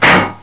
bang

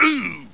ungh

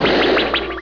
whap

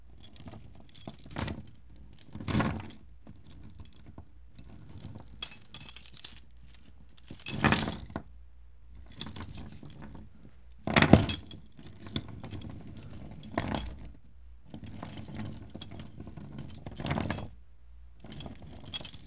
chain; Wired for Sound Pro CD; Aristosoft, Inc.

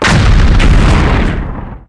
Boom; Falcon 40

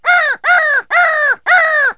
bird; Wired for Sound Pro CD; Aristosoft, Inc.

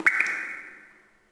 drip; DAoC

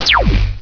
f box

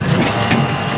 machine; MacAddict; tommy

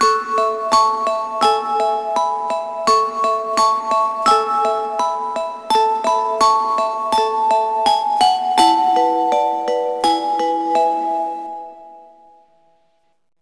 celesta

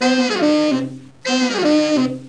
SAX

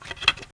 bow end; thief

